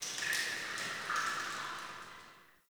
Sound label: Alley Water Drips; Ambience; Dungeons; Under City